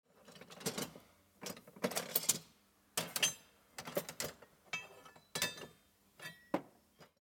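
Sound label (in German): Maulschlüssel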